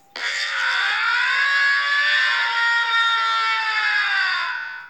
scream

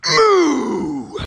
strong mooo

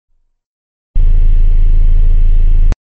shield hum; nfs rivals beta audio

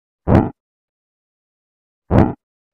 heavy blunt weapon swing

